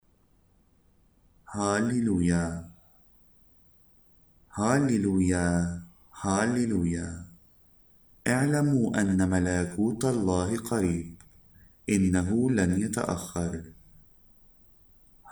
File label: Halleluiah, arabic mass